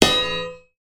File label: impact metal heavy thump clunk